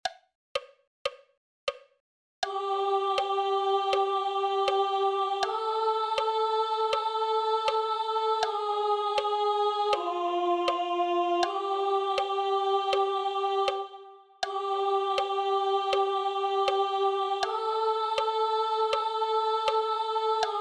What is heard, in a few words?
Soprano; LA CHORALE ANARCHISTE